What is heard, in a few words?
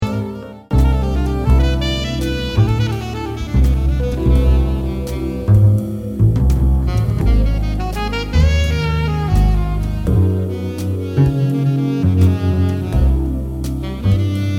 Song Alto; NY M80th